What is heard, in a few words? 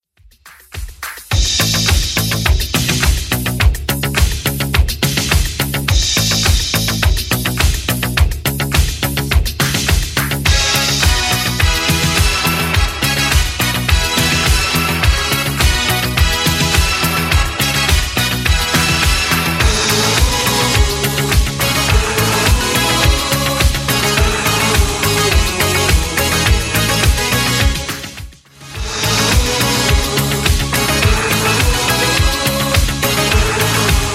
80s Redrum 105bpm